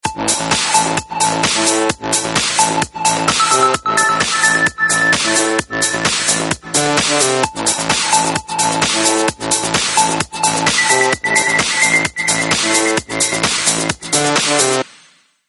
Ringtone, Samsung GT-S3310

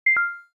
cam stop

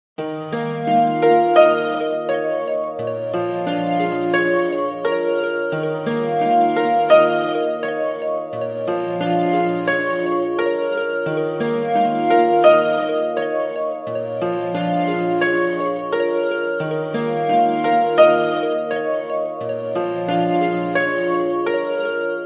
piano

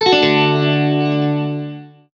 alert, strum; Sony Ericsson; PlusFourSix; Josephine (G700); Lars Edvall; Josalita Alert 2 (Strum)

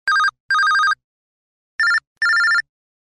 Simple Beep

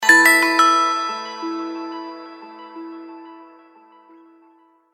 Bells, ringtones; Motorola Backflip

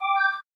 NFC Start, phone tones; LG K10 T-Mobile

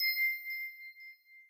eclipse flicking; LG G Flex

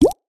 notification beep sound

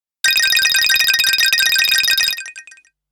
Digital; Nokia Alerts; Windows Phone apps

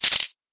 camera click

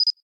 camera focus, ui

